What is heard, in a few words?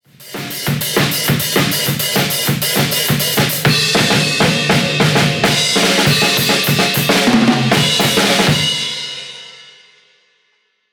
Drum